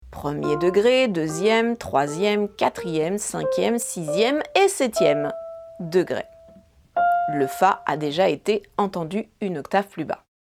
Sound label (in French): gamme fa majeur